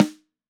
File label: Snare Damp Stick Center, drums